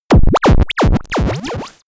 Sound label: impulsive bong